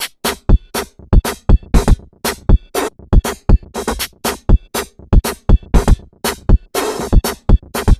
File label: junk hip 120bpm, Downbeat Dance Grooves